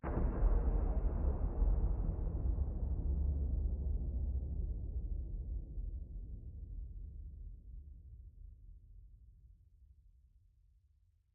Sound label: end flash, ambient weather; minecraft 1.21.10